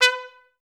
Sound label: STAC B3, Orchestra; Best Service; Peter Siedlaczek's Advanced Orchestra